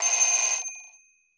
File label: Alarm; Alert Sounds; sound play workshop sounds